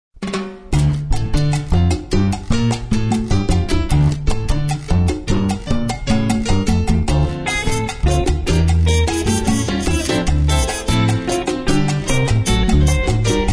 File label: Latin Jazz